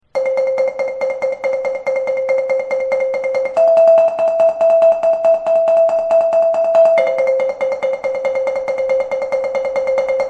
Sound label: layering, marimba